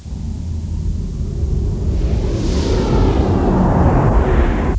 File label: fly by